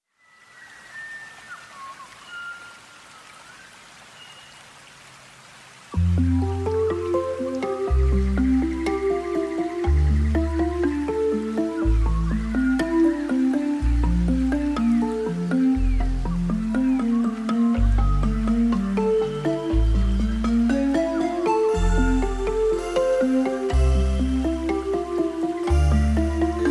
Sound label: ambient